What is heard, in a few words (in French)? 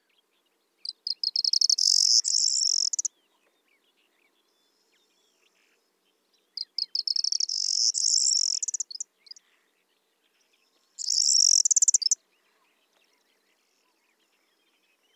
Bruant proyer